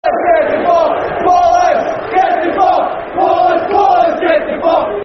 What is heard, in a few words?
Football Chant (Soccer Song); Police Get Ta Fuck; Heart Of Midlothian, Hearts Hfc